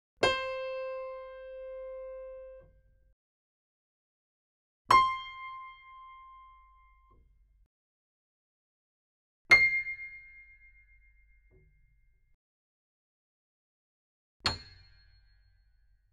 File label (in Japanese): Pf C5, 打弦; C5～C8; 弦鳴楽器